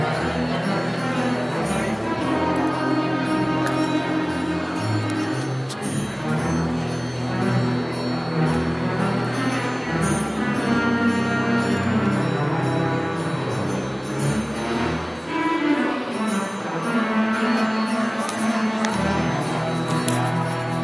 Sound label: bootleg organ; organ stop pizza